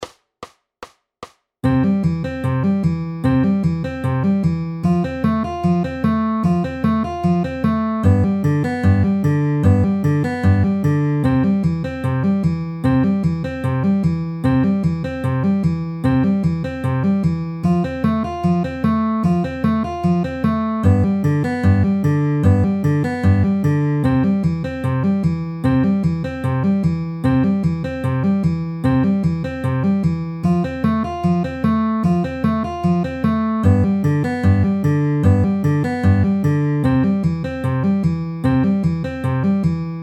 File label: C F G C downward arpeggio middle middle at 150 bpm